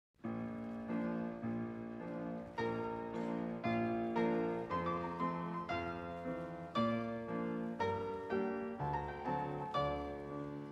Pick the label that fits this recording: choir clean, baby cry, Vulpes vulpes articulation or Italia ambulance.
choir clean